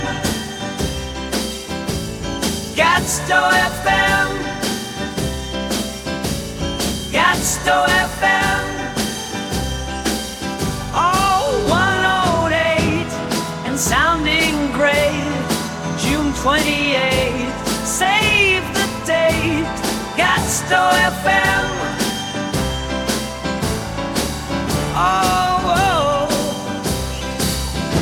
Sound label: JINGLE